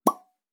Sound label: Open the soda